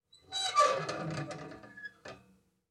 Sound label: Jail Door Metal Door Squeak; Fairlight Sound Lib